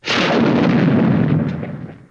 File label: bazooka; PC Gamer IT CD 32